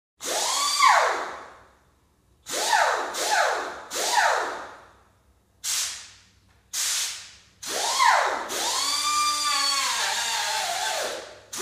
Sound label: Small Air Sander Revb